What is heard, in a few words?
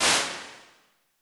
Plates Large Plate 1.5s, impulse responses; Ableton Live 12 Lite; Hybrid